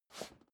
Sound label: step boots metal dry slide short; schritte; Footsteps